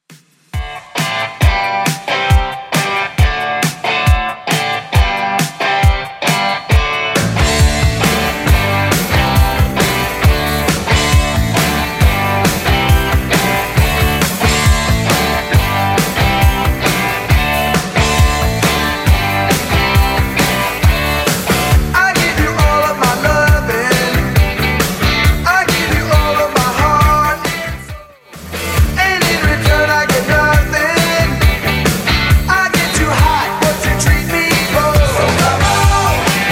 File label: 80s Redrum 136 bpm; remixplanet